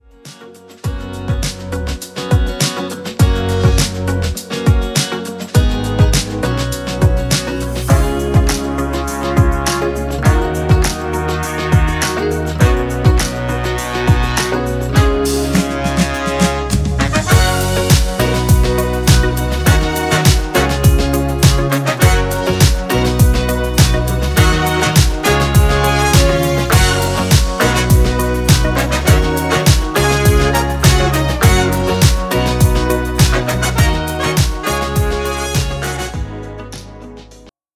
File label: Instrumental